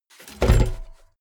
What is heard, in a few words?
bmx bike bar spin heavy